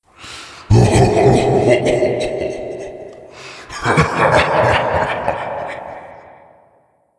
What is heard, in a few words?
laugh